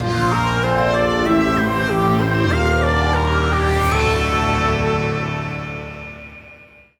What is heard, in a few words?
Result Win Item Obtained